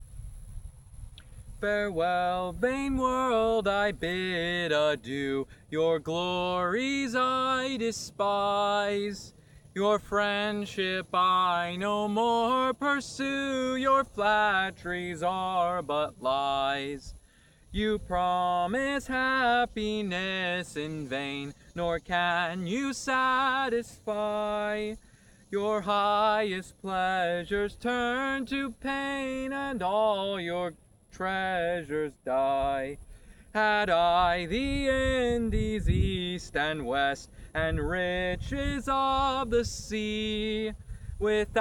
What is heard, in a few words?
Singing; Farewell Vain World